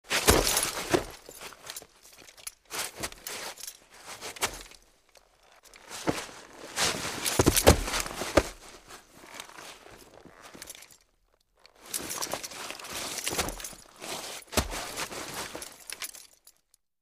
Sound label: Body Crawls Gear Body, bodyfalls